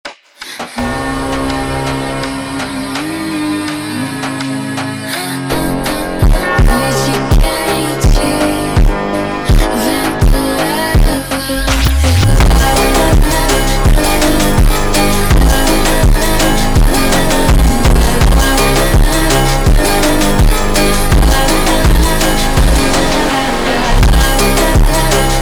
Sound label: Funk Slowed